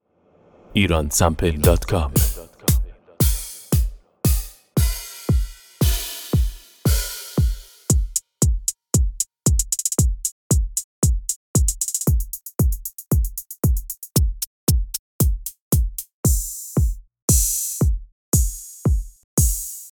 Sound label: Hi Hat